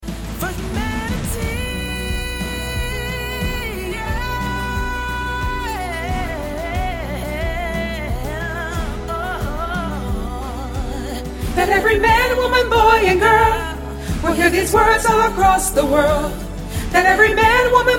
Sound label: Prayer For Humanity, Soprano